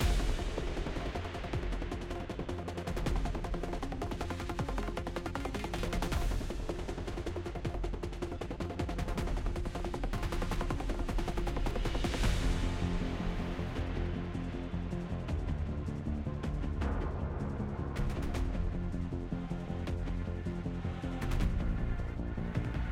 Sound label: mood loop battle